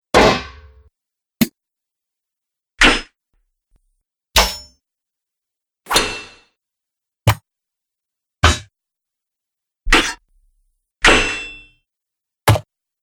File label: Sword And Weapon Hits; 10 Sword And Weapon Hits - Pack 2